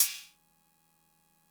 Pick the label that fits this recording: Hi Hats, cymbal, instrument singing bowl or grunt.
cymbal